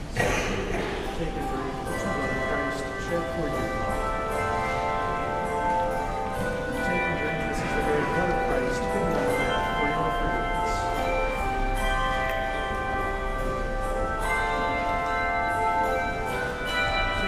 Handbells; Lord of All Hopefulness

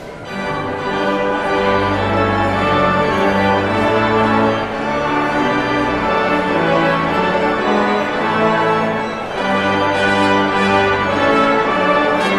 Postlude

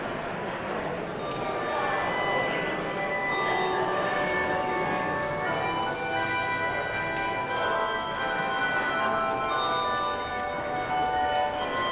Handbells; In the Cross of Christ I Glory

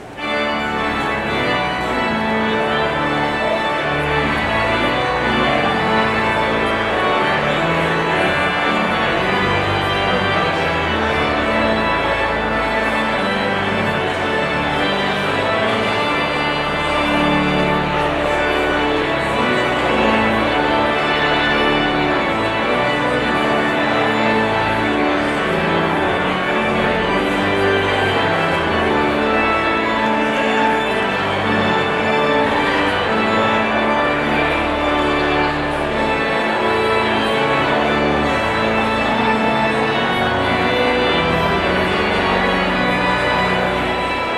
Postlude Trumpet Tune; David Johnson

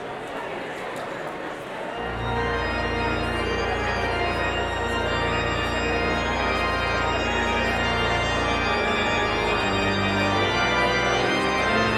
Postlude

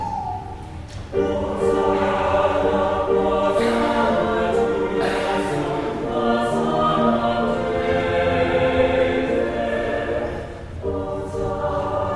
Choral

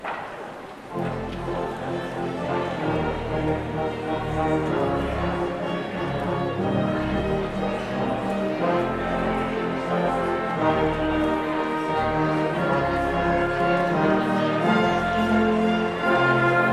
Postlude

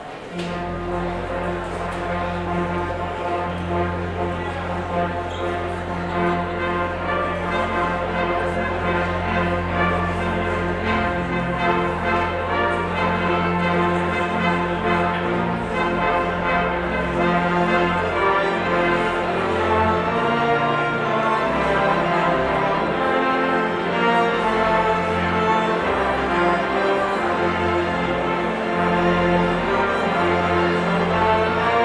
Postlude

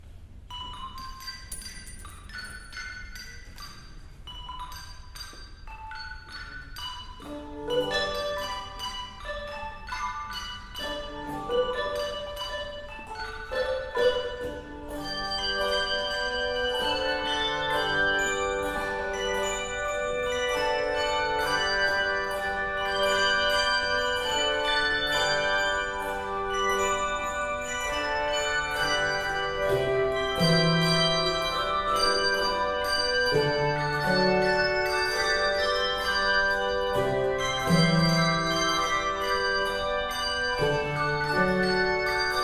Handbells

